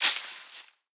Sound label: Disc Rico Tree; boomerang fu